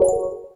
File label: hold cube tap; SKY IM-A910S-VEGA-Iron-2